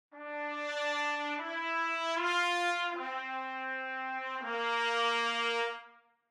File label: Brass 3 Tps Legato